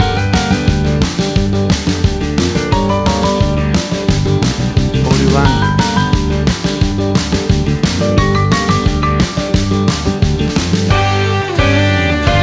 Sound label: Jukebox Country Shuffle Rodeo Music; 15 SEC